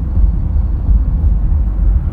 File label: Buzz